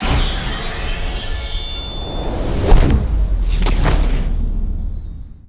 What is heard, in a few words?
Reward; Rabbids Multiverse